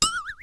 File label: Ball Miss; HeavenStudioPlus; working Dough